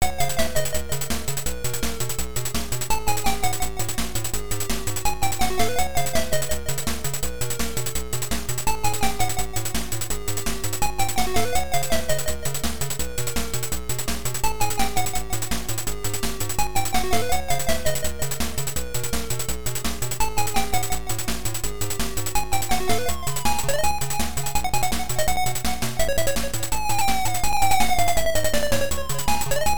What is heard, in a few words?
Surface BGM; Magmax; Arcade